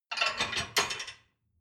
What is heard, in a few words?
dishwasher plate place